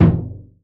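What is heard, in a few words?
bass drum medium; Northstar - New Gold VOL-2; 30S BRUSH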